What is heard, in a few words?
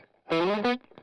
Strum Line